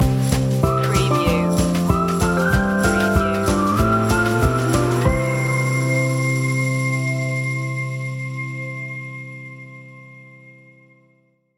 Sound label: conifers stinger